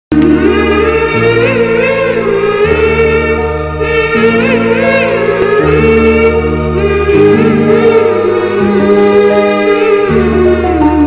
feel good bgm; Subhakankshalu

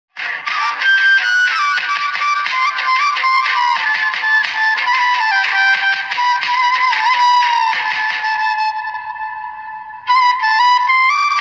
navratri garba ringtone

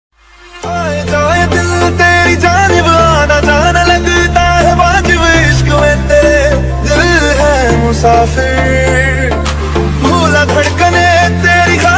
instrumental ringtone, love ringtone

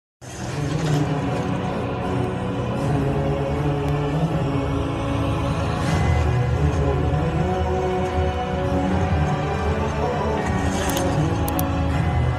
climax emotional bgm ringtone; Devara; NTR

